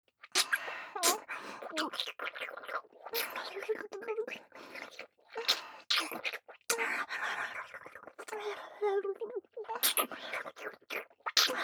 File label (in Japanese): ディープキス, 姉; レイナ